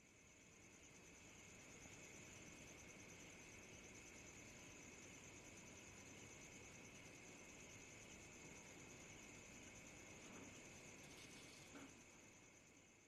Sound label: night ambience